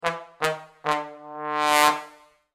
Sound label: Trombone